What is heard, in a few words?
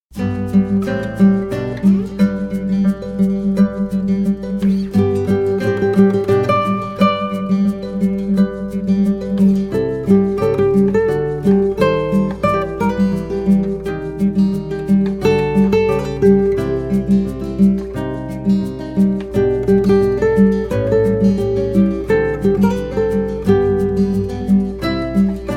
nylon and steel